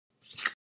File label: card flip